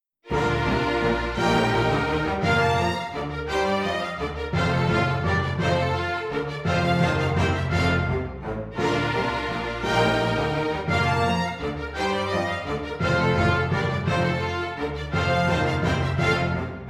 Minuetto in si bemolle maggiore per orchestra; versione Gerd Prengel

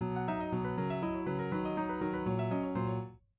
Passo per pianoforte

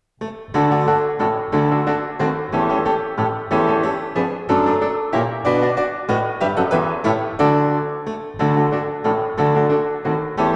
Valzer pianoforte; von G. Hellmesberger

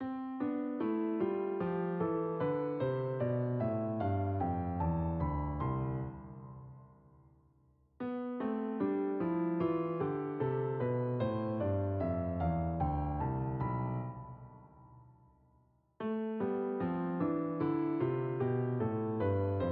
Esercizi per scale

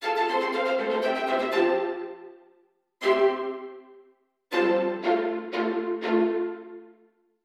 Violini viole e violoncel; Sinfonia numero 8 in fa maggiore Opus 93; Frammento

